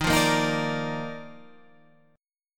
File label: D sharp Major 7th Suspended 2nd D sharp Guitar Half Step Down, chord